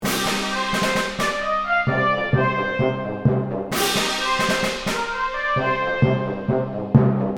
Field drum and brass marching loop

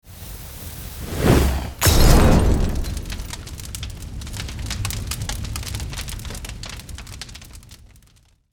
Molotov throw glass break and fire; war sound effects

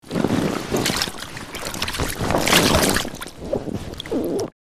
Lilo inflatable mattress person movement in water

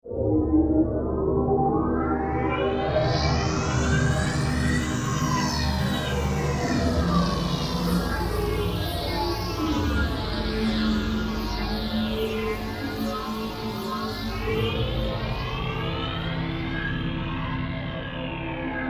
Ambient sci fi drone

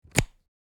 Plug insertion cable click; headphones and speakers

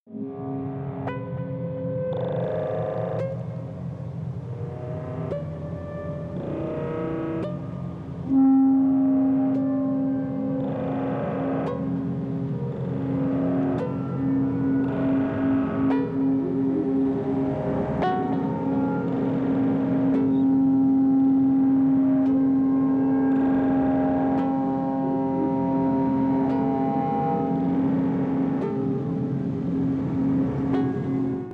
Sci fi atmosphere loopable dark and foreboding

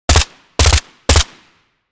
Sterling submachine gun two three round bursts

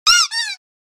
Playful toy squeeze; shorts sound effects

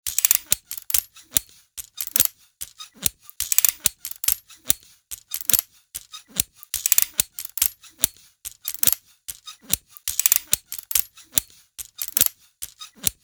Small industrial machine loop

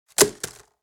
Wicker basket set down

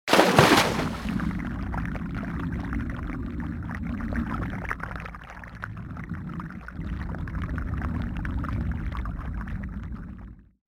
Athlete single dive underwater

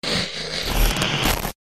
Distorted glitch

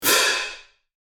Italian cymbal crash short

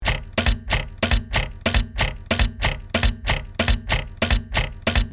Steam engine metallic ticking mechanical loop

